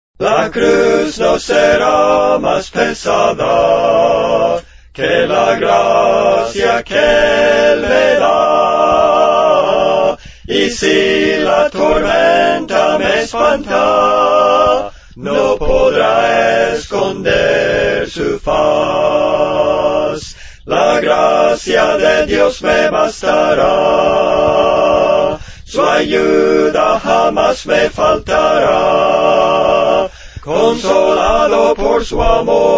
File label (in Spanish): 4 Voces, Cantos Espirituales; Las cuatro voces